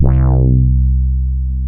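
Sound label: MOOG MODULAR C2; Hollywood Edge - Giorgio Moroder Rare Synthesizer Collection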